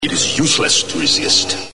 pain; JK2; vader

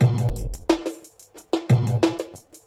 BACK BT, Hip Hop, Backbts; LiveAct Pool

